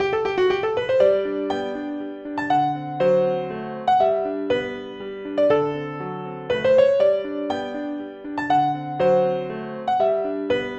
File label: Main Background; Bidiots; Jackbox Games; The Jackbox Party Pack 2